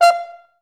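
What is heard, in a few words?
FHS1 staccato F4, Advanced Orchestra; Best Service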